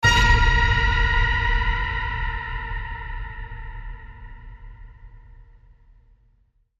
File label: Fright Ping